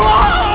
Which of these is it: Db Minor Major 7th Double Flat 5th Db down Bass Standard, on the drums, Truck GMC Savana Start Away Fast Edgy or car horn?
car horn